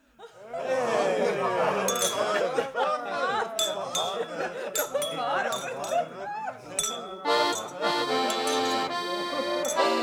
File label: happy birthday song